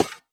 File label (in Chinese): 试炼刷怪笼踩踏声